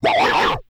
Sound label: BARK, VOCAL FX; Spectrasonics Vocal Planet; Jazz FX